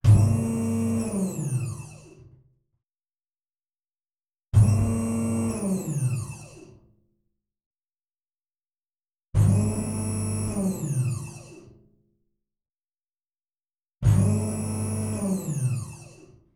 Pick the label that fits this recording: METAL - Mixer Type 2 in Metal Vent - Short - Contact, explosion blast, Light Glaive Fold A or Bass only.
METAL - Mixer Type 2 in Metal Vent - Short - Contact